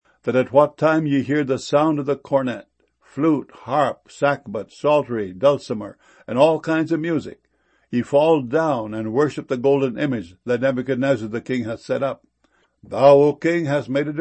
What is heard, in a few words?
dulcimer